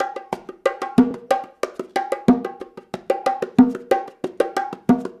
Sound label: Call And Response Bongos, 70s Analog Funk; Sonic Foundry (Sony Creative Software); What it Is!